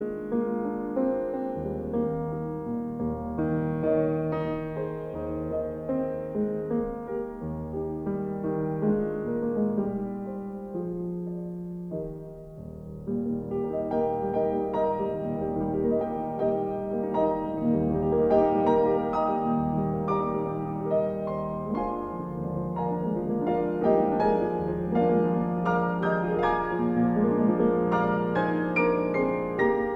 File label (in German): Wiegenlied (piano)